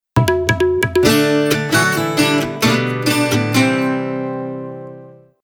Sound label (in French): acoustique signature